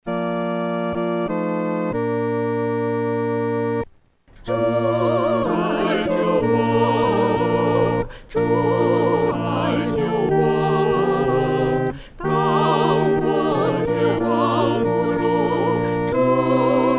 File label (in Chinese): 合唱 四声部